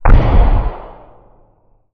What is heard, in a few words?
explosion